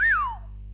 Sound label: pisk down